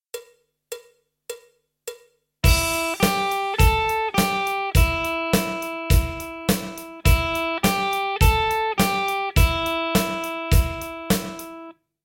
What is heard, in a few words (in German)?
Blues-Rock Lick, E-Gitarren